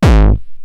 Roland TR-909 BD; BD Selection

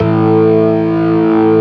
STOPS, ORGAN; Keith Emerson Signature Series

